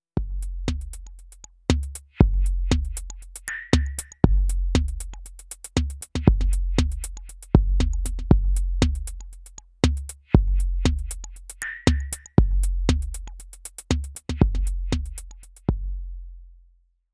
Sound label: melody drum pattern